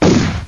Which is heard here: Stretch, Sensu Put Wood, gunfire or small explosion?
gunfire